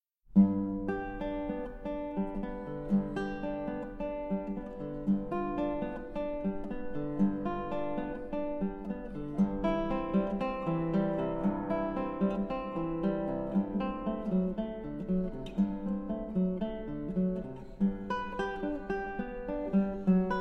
Cello Suite No. 4 in E-Flat Major, For Guitar, Prélude; BWV 1010; Arr. J. McFadden